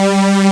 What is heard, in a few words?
Synth Strings G2; Proteus 2000; Digital Sound Factory Proteus 2000